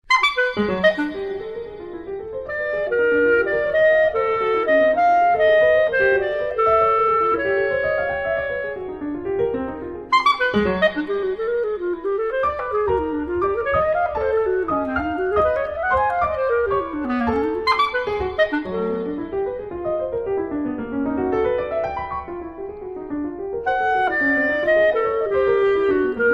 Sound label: Songs Without Words C Clarinet